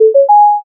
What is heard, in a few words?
success